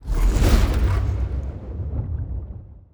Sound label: ship juggernaut move; aquatics